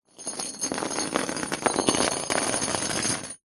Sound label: industrial chain med movement on wood panel; zapsplat